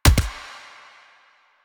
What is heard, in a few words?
Pistol Punch; Weapons; DE; Warframe; Tenno; Lasrian